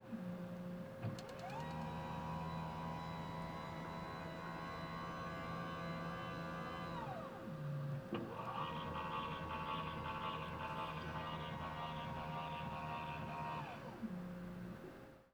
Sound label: Terminal, Motor, Running, Vertical, Up, Down, Pneumatic - Terminal Machine adjusting height; Optometrist Equipment; SHhh! Listen SFX Libraries